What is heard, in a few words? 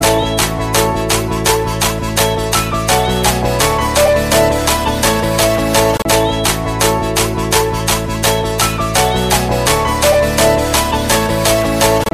nokia 7.1 plus ringtone